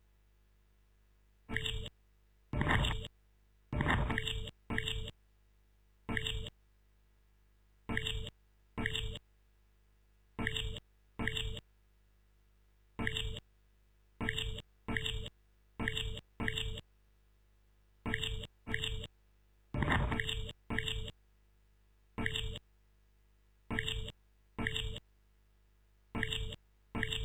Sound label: stereo instr loop; rauschpartikel